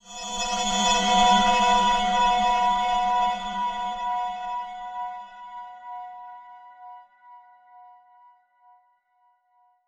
sweeper crystal glow mechanical scrapes

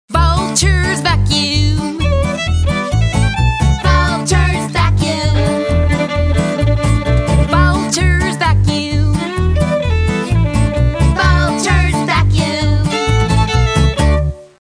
Song Letters v V S O N G; School; Fisher Price Ready for School Kindergarten

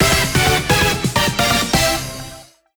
Coin Miracle Jingle; Penny-Punching Princess; PS Vita

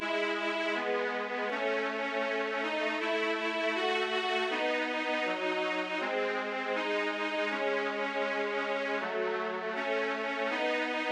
Town Strings; backpack hero gamerip 2022

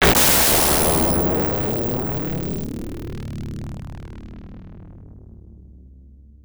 Explosion; Spindizzy II; X68000

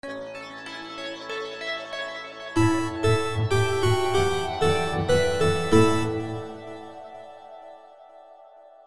Item Collect Long

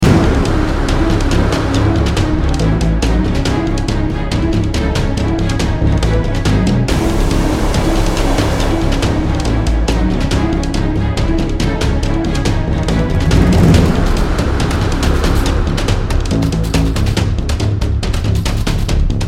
dark loop; gamerip

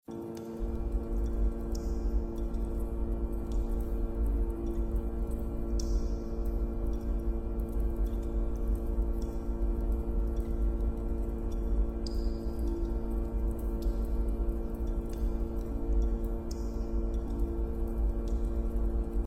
Final Area Ambience; escape the backrooms

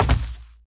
Footsteps; SFX Character

